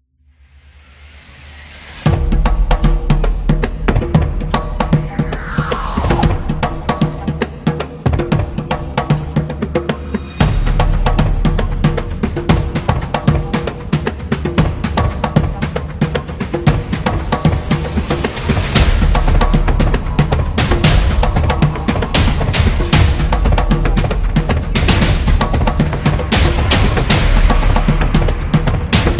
Tribal Drums

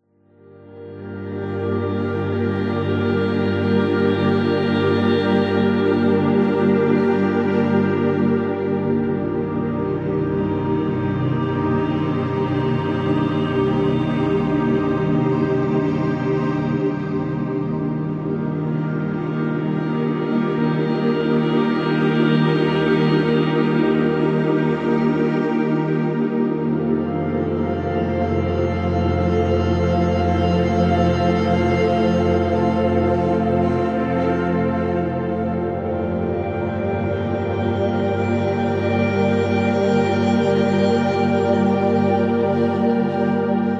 For Meditation, meditative

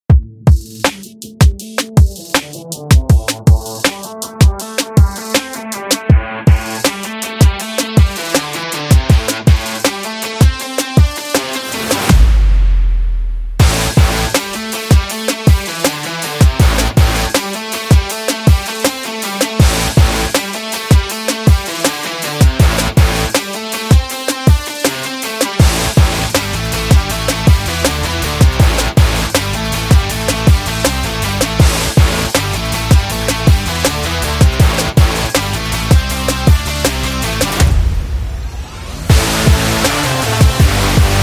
Hip Hop Rock

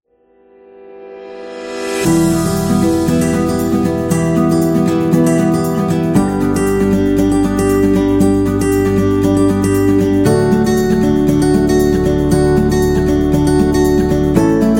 Romantic Guitar

